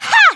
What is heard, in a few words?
Christmas Melee Attack